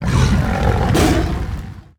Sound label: Druid Of The Flame Play